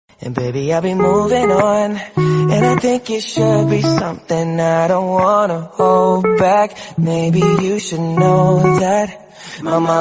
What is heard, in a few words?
english song tone ringtone